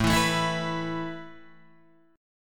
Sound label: A Suspended 2nd A Guitar Open D